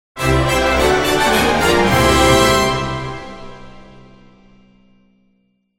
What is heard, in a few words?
light optimistic orchestral logo